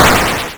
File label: Hit